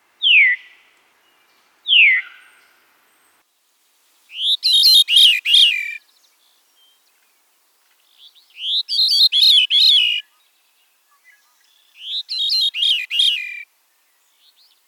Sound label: Veery